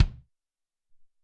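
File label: Lite Kick, Alesis SR-18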